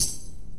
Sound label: normal slider tick; Osu!; Emilia